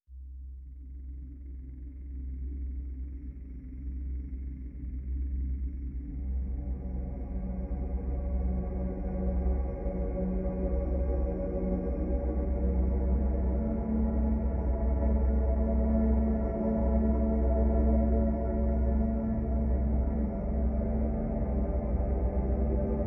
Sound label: Drone; bignic domina official soundtrack; Drone One Fifteen